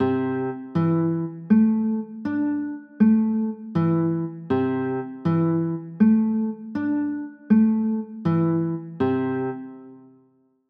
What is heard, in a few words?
c 6 9, chords; pos 4